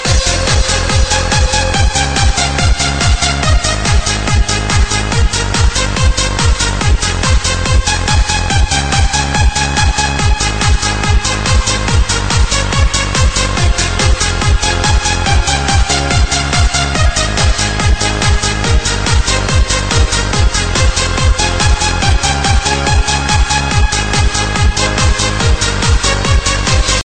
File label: Trance remix